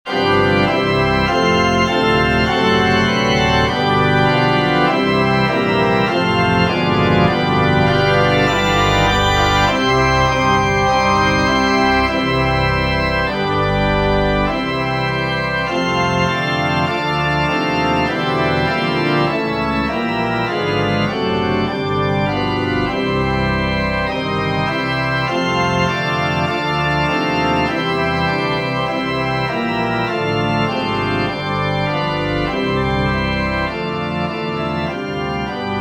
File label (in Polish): ORGANY; Gdy sie Chrystus rodzi